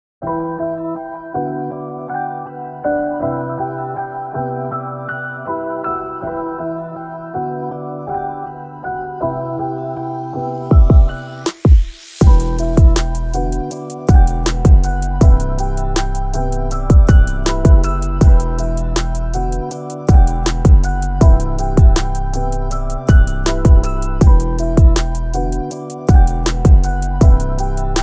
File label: sad piano